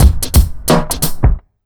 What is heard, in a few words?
88 beat, Underground Hip Hop; USB Soundscan vol.02; AKAI